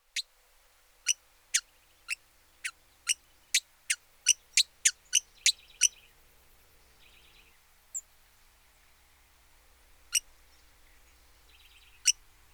Hooded visorbearer, پرنده; آفتابگیر کلاهدار

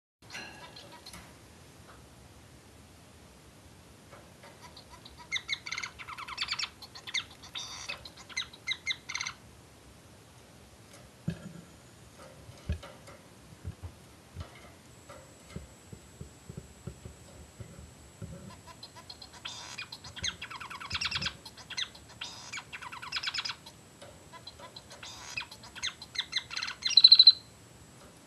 society finch sound

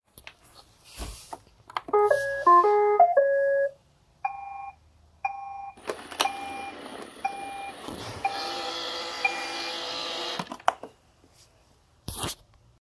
Robotic sweeper

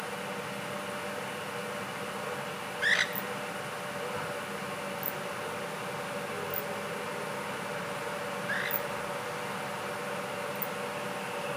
Western swamphen

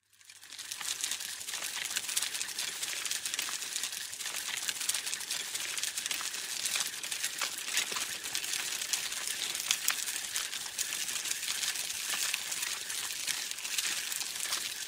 baby carriage; کالسکه کودک

